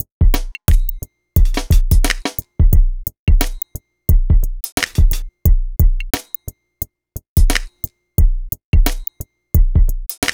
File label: Urban Beat, Drums